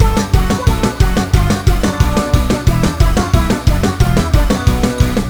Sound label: alert theme; roblox; coolkidd; slender